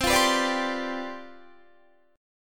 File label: C Major 7th Suspended 2nd Suspended 4th C down Guitar, chord; CM7sus2sus4 strummed